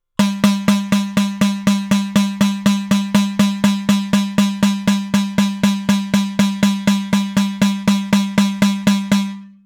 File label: 4 4 120 bpm